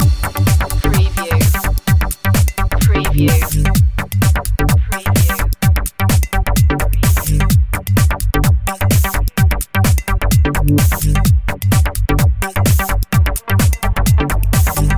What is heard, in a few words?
particles loop